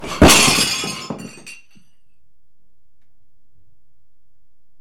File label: Crockery Smash